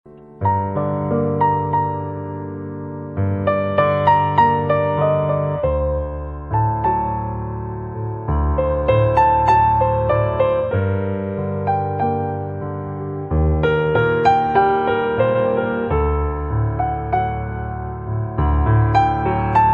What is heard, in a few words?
Ringtone Mobile